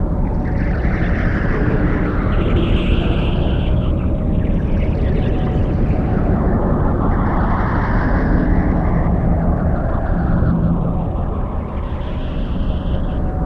Blackhole, ambient

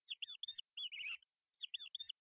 rat

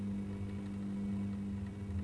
neon; lampe

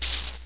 palm, plant

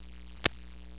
snap, plant

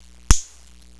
snap, plant

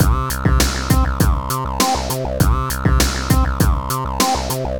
synth lp

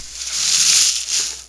leaves, plant

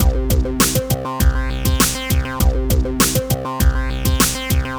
synth lp

